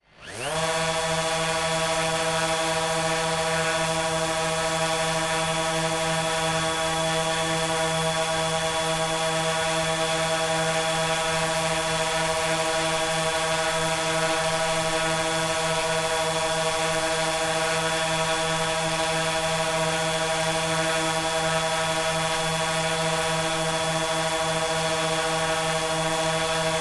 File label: quadcopter engine right turn constant; mkh8040